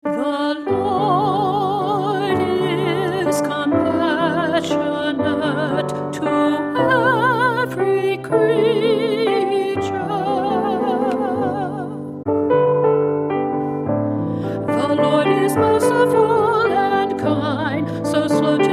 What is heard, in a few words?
Psalm 145 - The Lord is Compassionate